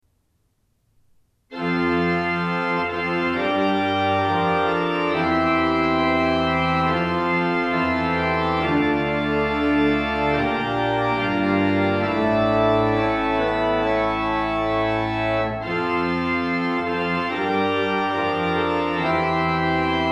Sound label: Fanfare; Sandar